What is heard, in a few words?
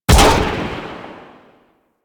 Bullet Jump Impact; Parkour; Mods